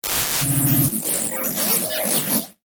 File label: Static Blast Data Transmission Radio Tuning; Sound Design; BBFX